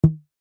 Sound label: rubber band thick large ping; foley; zapsplat